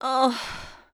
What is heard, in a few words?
Female Tired Breath